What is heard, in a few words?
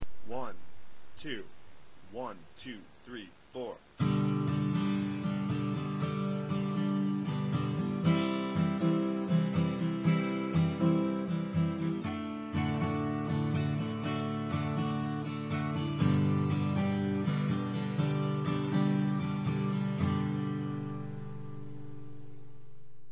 strum, guitar acoustic